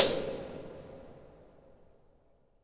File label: WATER TANK, Impacts, Hits & Booms; Distored Reality 1&2